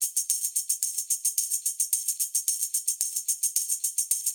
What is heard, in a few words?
Tamb Proc 110, funk soul Beats; sampled funk soul